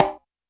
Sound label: bongo; Roland R-70; Perc